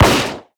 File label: gun fire; ddnet